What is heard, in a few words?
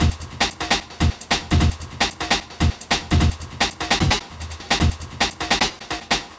drum; Evolution